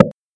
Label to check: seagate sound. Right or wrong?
Wrong